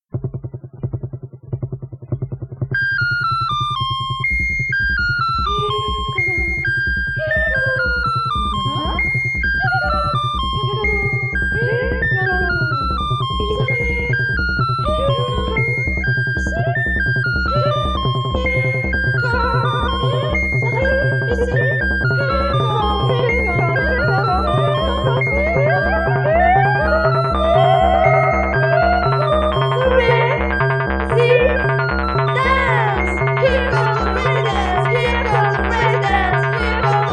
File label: Jingle